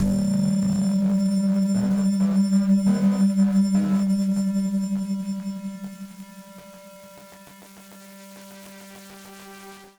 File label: Battered Synth; malfunctioning synth samples